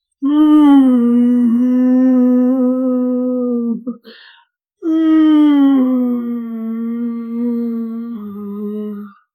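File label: a hollow hungry stomach, male voice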